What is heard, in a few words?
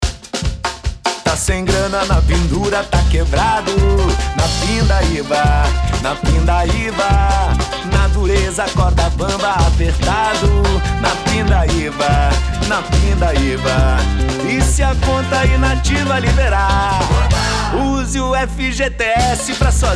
Jingle; FGTS